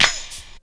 combi drums

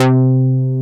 Synth Bass, Roland

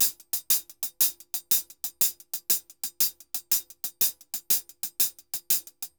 HH, Festa Latina, Drums & Percussion; Best Service; Festa Latina VOL-1

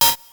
DR-660 FX; Rubber Chicken Software Sample Bank

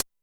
closed hihat, Zoom MRT-3B

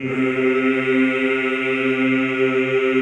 CHO Yhh, Roland

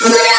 Glitch

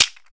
PRC WOLOF, Afro Shakers; Roland LCDP14 Africa VOL-2

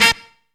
FAFARE, FUNKY SYNTHS; Zero-G; Funky Elements

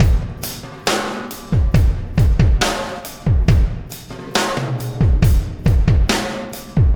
Landing Party Beat, Processed Drumkits

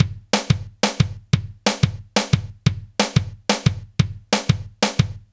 Kick Snare Loop

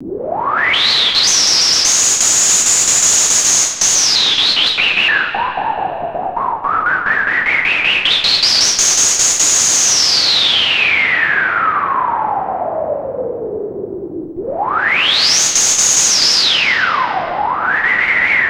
CHOP SWEEPS, SYNTH; Keith Emerson Signature Series

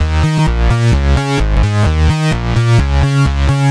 Moog Fours Eb 129, Synth Loops, 129bpm; Zero-G; Soundclash; Nu Dance Electro

